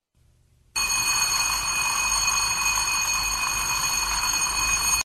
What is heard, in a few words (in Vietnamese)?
Tieng chuong het gio